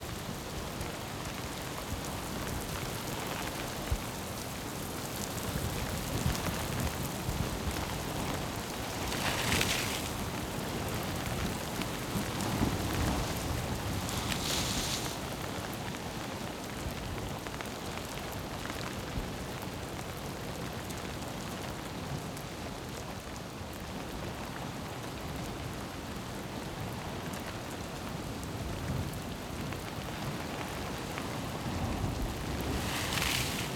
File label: Inside boat rain, Sirocco Sea Storm - Mediterranean sea; Ivo Vicic